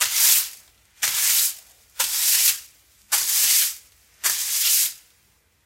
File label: sweep